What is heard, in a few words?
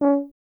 BRS TUBA, Solo Instruments